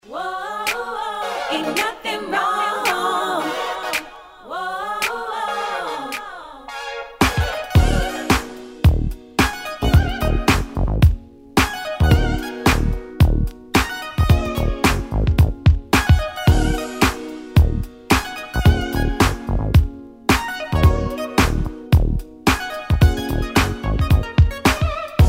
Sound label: Arr. For Guitar; A Dieu Celle